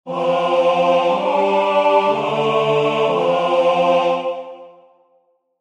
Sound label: organum